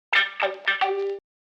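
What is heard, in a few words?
notify guitar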